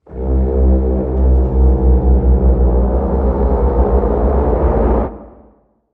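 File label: Bend Contrary Brass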